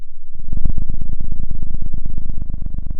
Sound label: pop, Sound of a cat purring